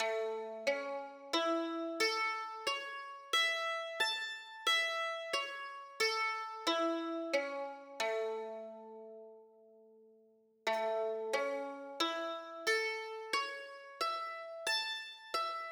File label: Arpeggios Double Stops